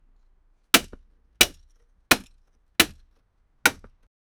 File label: the sound of an axe, axe chop